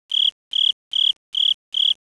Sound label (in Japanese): tsuzuresasekoorogi; insects